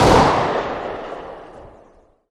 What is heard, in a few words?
p250 distant; arccw go